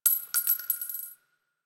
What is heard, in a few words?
AK47 Shell Casing; Tenno